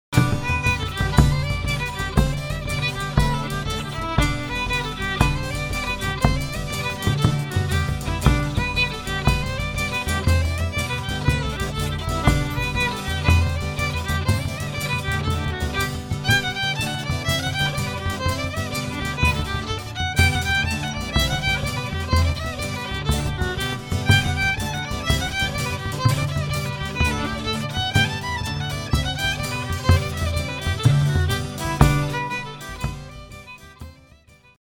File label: JIGS